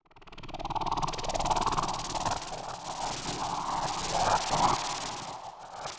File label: Noise, Processed Noise